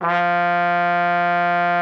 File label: TROM F3, TRUMPET; Xsample VOL-3